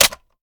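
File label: sniper reload fast mag in; gmod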